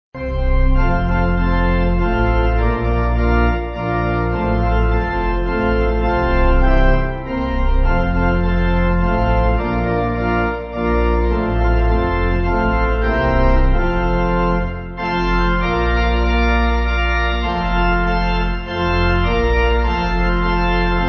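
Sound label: There Is No Name, Pipe Organ; Bradbury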